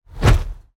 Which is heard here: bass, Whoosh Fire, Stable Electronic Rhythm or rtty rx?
Whoosh Fire